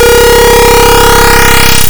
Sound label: Rescued; tempest 4000